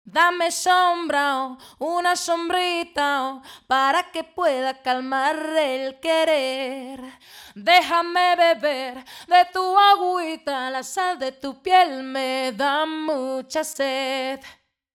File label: Empirical Labs Distressor test vocals dry; uad